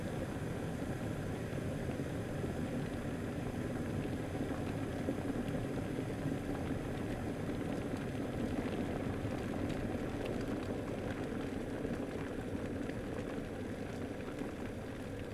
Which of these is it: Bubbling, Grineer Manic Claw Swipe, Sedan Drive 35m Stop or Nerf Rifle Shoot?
Bubbling